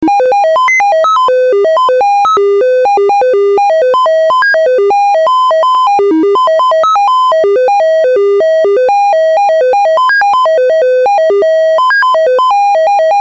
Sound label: samp hold fourth steps, analogsynth, VOLTAGEQUANTIZER